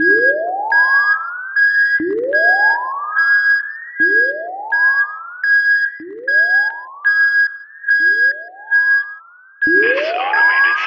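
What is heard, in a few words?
burglar alarm; Zomboid 41.78.16; Project Zomboid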